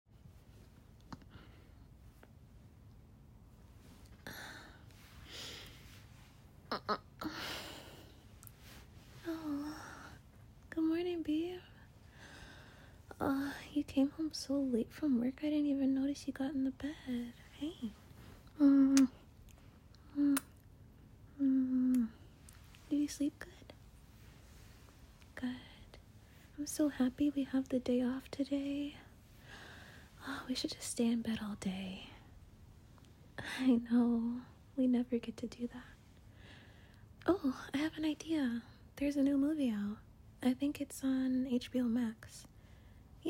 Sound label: F4M wet noises; blowjob; female moaning; Let me help you with your morning wood